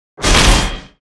Attack; Loussier; Gamigo

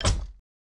Item Put

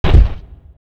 Triceratops Walk; Seer; GamigoNA

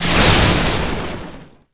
Atk Skil

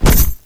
Dmg Mace Hard; Seer; GamigoNA

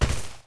Rhinoce Hunter walk; Seer; GamigoNA

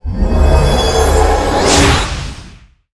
emperor slime skill

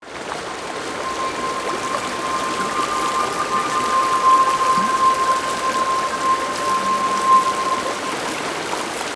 Magic Fountain; Seer; GamigoNA

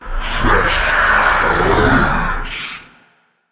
Drain Mind; Gamigo

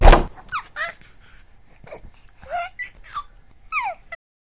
Mimic Stand; Seer; GamigoNA

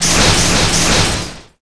Bash Strike

